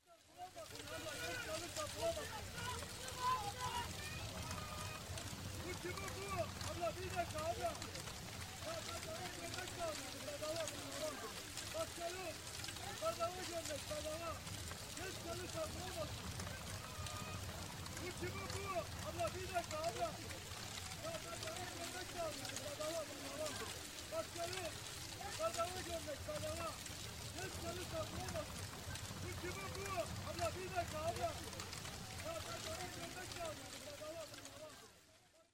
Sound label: Fire and People; Age of Empires III; cinematics